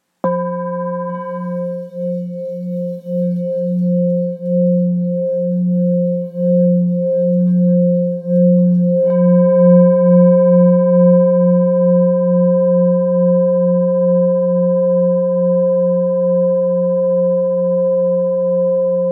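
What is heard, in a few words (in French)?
Bol thick fa, bols chantants tibétains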